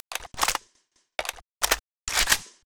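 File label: vsh reload; vishr; STALKER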